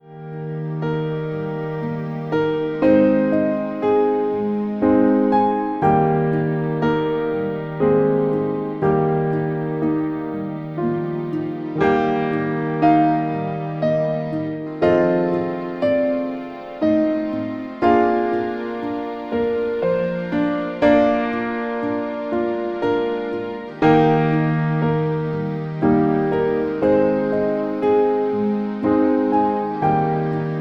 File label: Piano Dreams